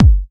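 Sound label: BD Trancy, bass drum; Takebeat; Club; VEC1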